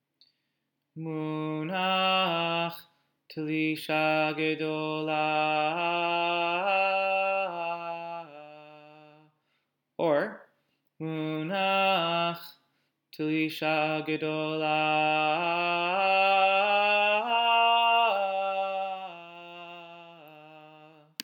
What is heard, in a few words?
Torah Trop Pattern